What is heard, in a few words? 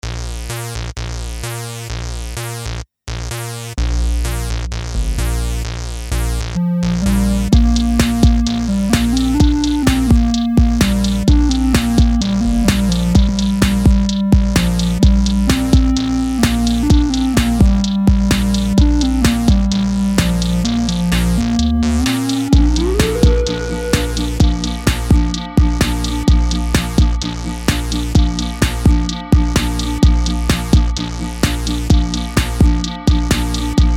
monochord cloud; weeklybeats-2020